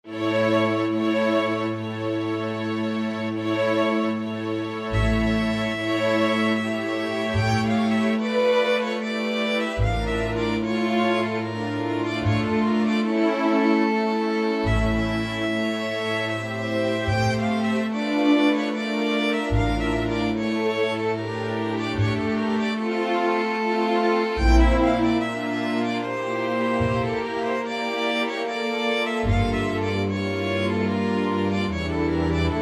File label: orchestra